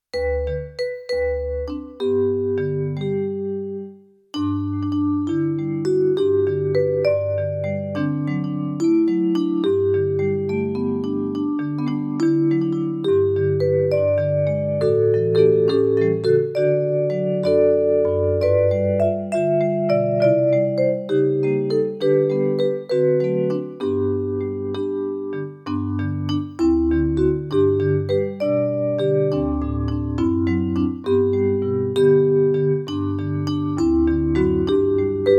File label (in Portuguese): playback hino